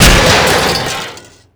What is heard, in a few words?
hit metal can; hits; Tony Hawk's Project 8; XBOX